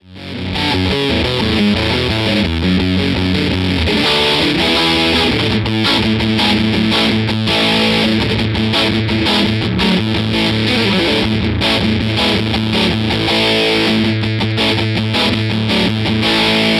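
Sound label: TC15 Channel 1 Crunch Hi hi INPUT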